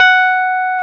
piano Rhodes

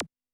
PUNCH, Yamaha SY85 Drums

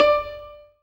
ZIMBAL D 4

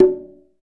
percussion congas

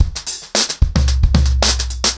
110BPM, acoustic drums, drumloops

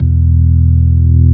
ORG B3, organs; Sound & Vision; Gigapack I CD 2

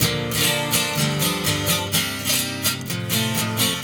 Vaguely Ethnik Guitars-C, 125; Zero-G Kocktail Kollection; Construction Kitz (Lounge)

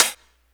hihat; Big Fish Audio; Hardcore Breakbeat Action; Shake That Booty

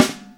JAZZ SNARE; E-MU Formula 4000 Series Vol. 1; Hip Hop Nation

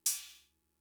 Cymbal; Fricke MFB-522

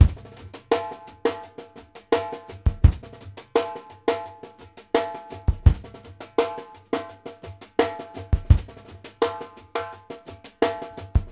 FUNK B, Drumworks; Sampleheads; New York City Drumworks VOL-1

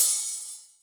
H Opn, Hi-Hat, Yamaha DTXpress

